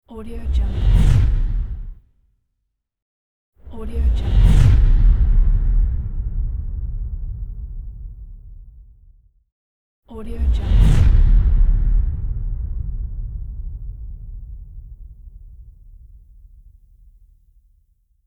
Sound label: woosh